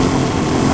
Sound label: Platform move down loop